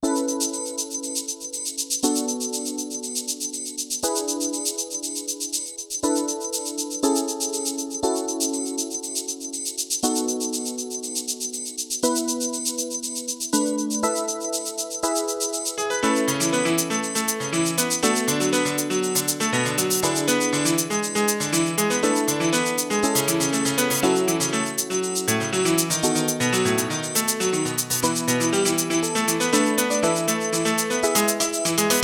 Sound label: montuno; Section Z; L Brain's Dreams